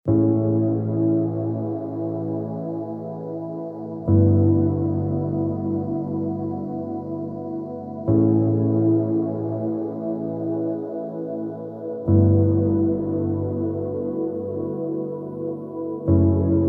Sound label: Chilled Ambient